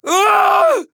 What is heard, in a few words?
Falling; paladins; Corvus